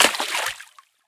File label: riffle drop puddle; STALKER; IWP